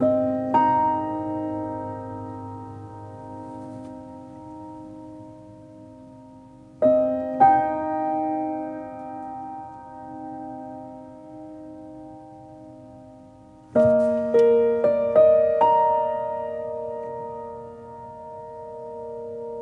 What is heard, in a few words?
Music Level Movingout Loop